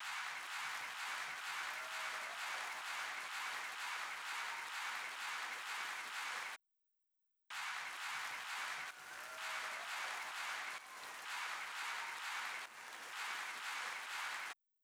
Drum Loop FX Crowd, 128BPM; VEC5; Drumloops; Multi Loops

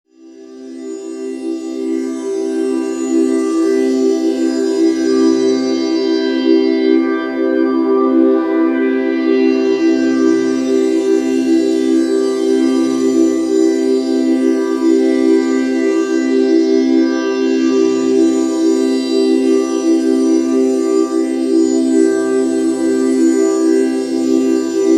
High Shimmer, Pad FX; Sonic Foundry (Sony Creative Software); James Johnson Spektral Minimalism

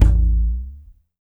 WD, DRM HITS; Spectrasonics; Liquid Grooves

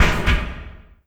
Oneshot

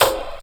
Fx Snare; Zero-G Jungle Warfare 1, 2 & 3; 90 s Sample CDs

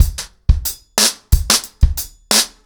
90BPM, acoustic drums, drumloops

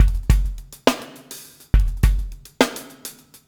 PEAK, Backbeat

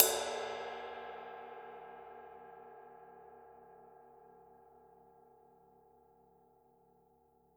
RIDE, Drums Percussion Kit1 Room Mic

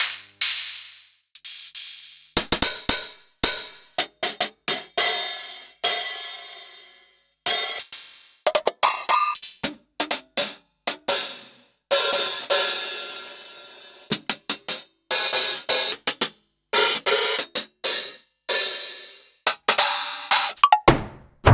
EMU Orbit

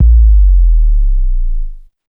BD, Big Beat Drumloops

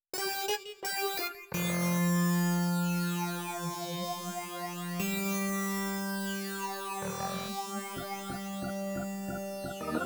Melody

